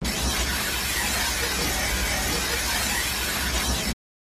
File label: Loco Brakes Scraping